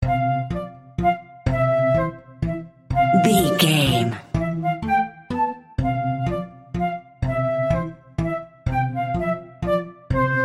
town theme

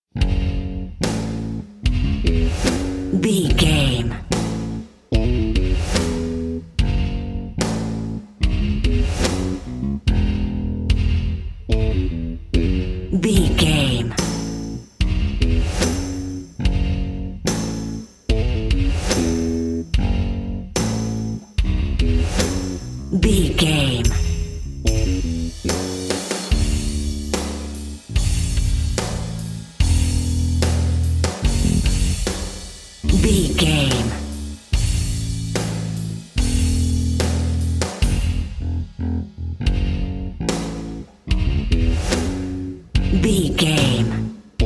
big game rock bass and drums

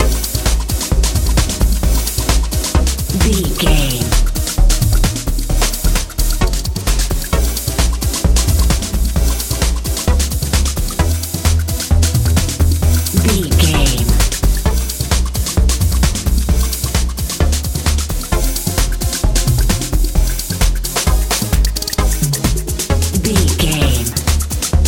happy warm big wind sprint love techno

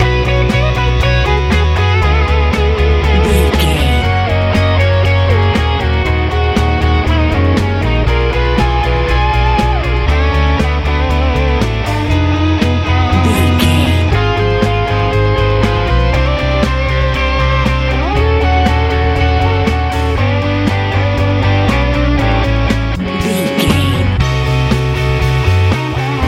easy pop rock

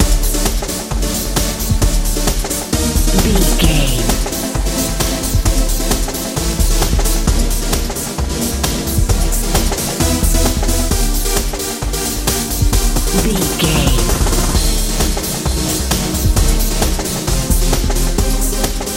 modern pop

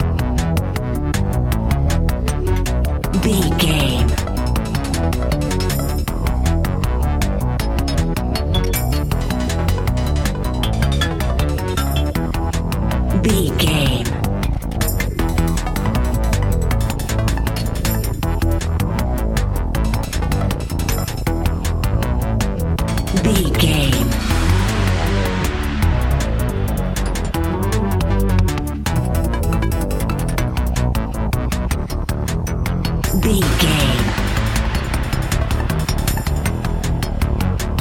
epic video game adventure dnb

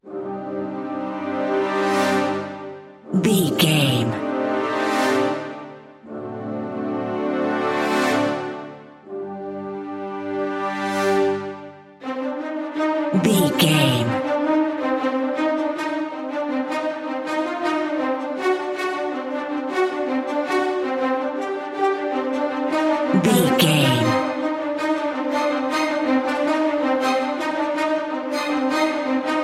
foothold brass and strings

